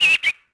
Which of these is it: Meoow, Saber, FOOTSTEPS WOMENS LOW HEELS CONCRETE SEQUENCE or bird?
bird